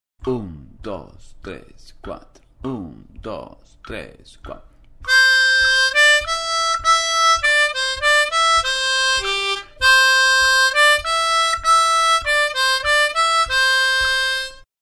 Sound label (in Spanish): Alouette 100, armonica